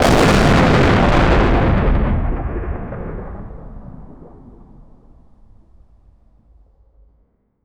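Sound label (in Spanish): explosion de demolicion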